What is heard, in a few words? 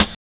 Snare, Drum and Bass